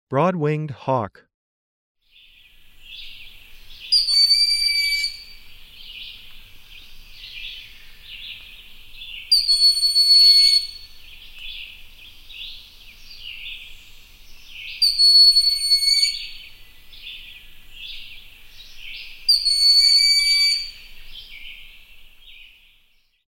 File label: broad winged hawk